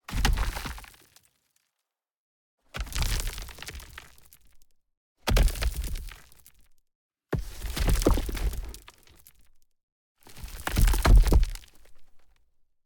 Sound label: Stones Large Impacts Debris - 1 Meter. Debris, Stones, Soil, Impact, Rock; Eradicated Tree; Fall, Various; Mattia Cellotto; Rocks Momentum